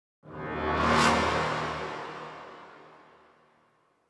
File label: Suspense Transition, Horror Sci-Fi Music; Dead Ark